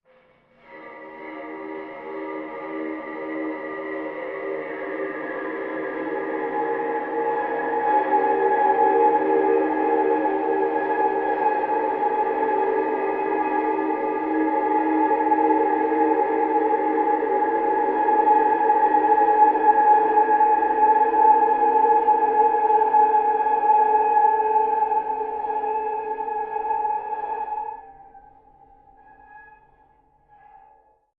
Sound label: atmosphere drone; sonniss gdc